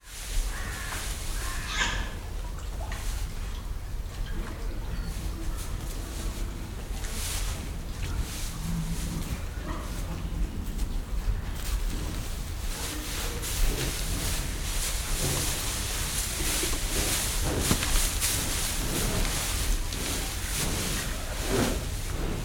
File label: ambience farm sheds cows walk on hay pigs crows in bg cows sniff at mic